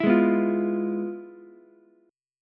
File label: F Minor Major 7th Flat 5th Ukulele; FmM7b5 strummed; JGuitar